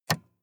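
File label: sports car interior switch off; verkehr transport; autos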